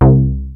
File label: ROBO BASS; Rubber Chicken Software Sample Bank